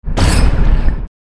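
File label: Air Spirit attack mid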